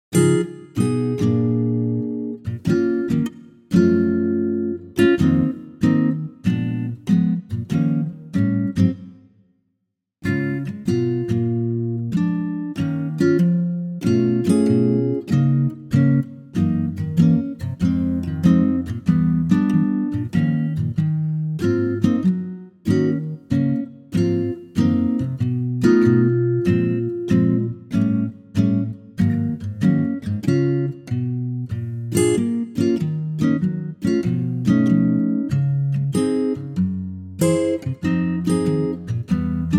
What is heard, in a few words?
Ac Guitar Db